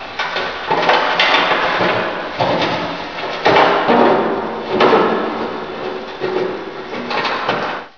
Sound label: vent scurry soft; hl2; borealis